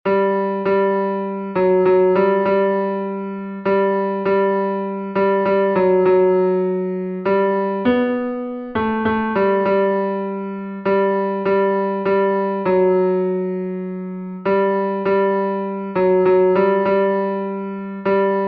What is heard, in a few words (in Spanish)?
tenor, himnos en piano; 78 MARAVILLOSO ES EL; cantos del camino